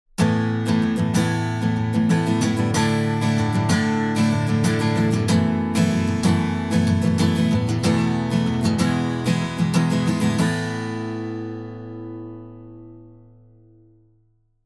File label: guitar AG stroke